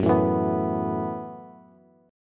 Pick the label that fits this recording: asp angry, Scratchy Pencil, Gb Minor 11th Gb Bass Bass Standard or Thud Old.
Gb Minor 11th Gb Bass Bass Standard